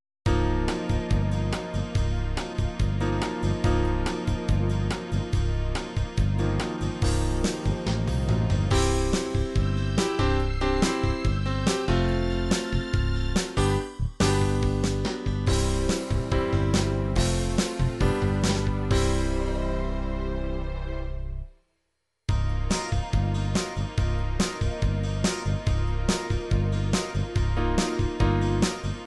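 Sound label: country rock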